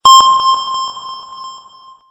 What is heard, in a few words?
Ping Sismo